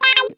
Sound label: WAH, Funk Guitar; Zero G - Funk Guitar